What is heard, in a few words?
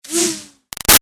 Arrow By Zip; Bow And Arrow Sound Effects; Bows and Arrows; Combat; Big Room Sound